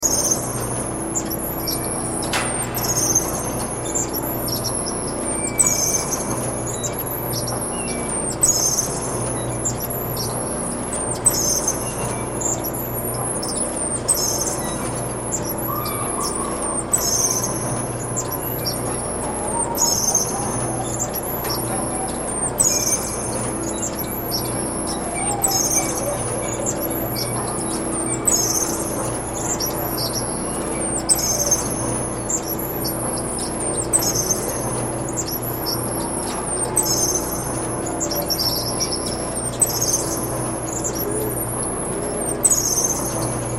Horror Laboratory Squeaky Machine Ambience Loop; Horror Laboratory Frankenstein Squeaky Machine Ambience Loop; Scary Tones; Blastwave

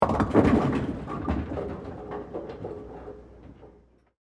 Bowling Pins, Knock, Over, Bowler, Perspective; Sports

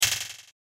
Fast Metal Edge Impact Hit; Miscellaneous Impact Sound Effects

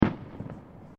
Fireworks Single Firecracker; Fireworks (Sound Effects:Backgrounds)

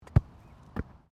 SOCCER BALL BOUNCE CATCH GRASS; Soccer ball sounds; Sports

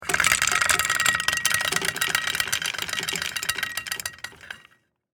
Chain Pulley Pull, Industry, Chain, Pulley, Pull; Factory Sound Effects; BlastWave

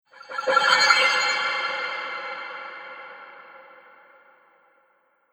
Water Illusion Spell Arcane Magic; Andrew Fleming

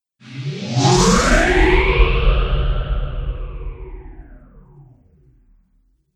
Trailer Hit Space Phaser Swoosh

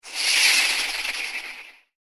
Snake Of Dark Magic, monster sound effect; fantasy; Andrew Fleming

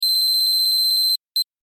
Beeps Data Transmission Technology; Scifi Science Fiction Multimedia; BBFX

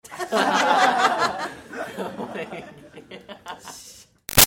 Crowd High school Class Laughs; Reax; Backgrounds; Schools

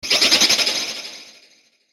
Ascend Warble Filter Delay; Warble Sound Effects

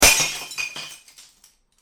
Bottle Smash Heavy; Smashes; Objects

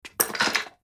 Chair Office Get Up; Chairs; Offices; Blastwave; Foley

